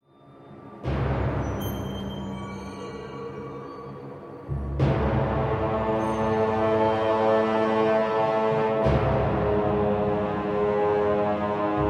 dungeon ambient

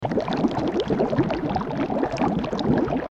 alchemy bubbles

